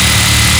giant heavy gun spin; tf2classic

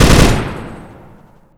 Shoot, weapons; LucyCharm; tf2classic

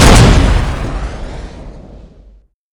rocket shoot, weapons; tf2classic

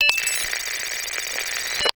draw remote deploy; tf2classic

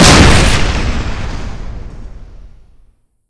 wasp explosion; tf2classic

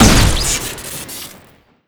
frag bomb launcher shoot crit; tf2classic

